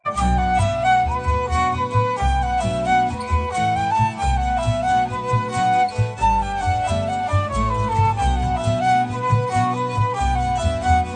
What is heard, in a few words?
Slip Jig, Lent; Humours of Whiskey; Trad.